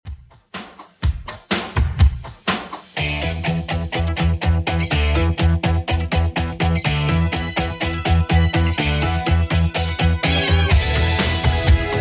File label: 80s Redrum 124bpm